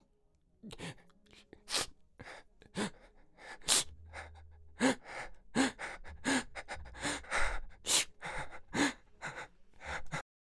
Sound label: Crying